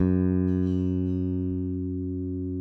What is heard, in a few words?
SPEC SUSTAIN, Bass; F#2; Sampleheads; Will Lee Bass Library